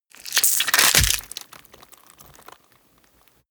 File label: gore rip body apart